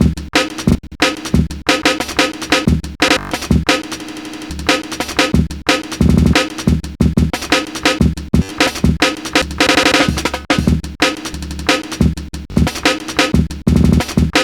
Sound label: break 180